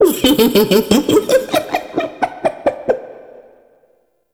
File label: Boss Vocal Laugh; Enemies; Vocals; Warframe; Oraxia